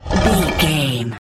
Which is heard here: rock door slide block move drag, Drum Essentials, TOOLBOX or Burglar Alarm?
rock door slide block move drag